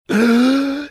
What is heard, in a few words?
inhaling sharply